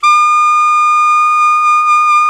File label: SAX ALTO MP; Alto 414